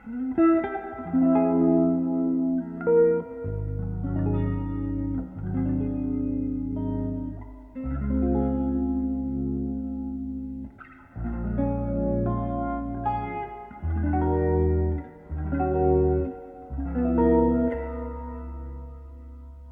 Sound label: leslie